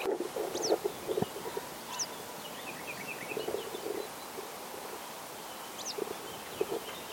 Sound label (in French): bergeronette printaniere